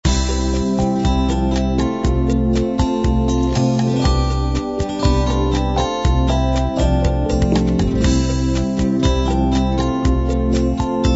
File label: unplugged 8beat intro